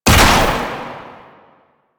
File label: Ranged Weapon Fire; Warframe; Necramech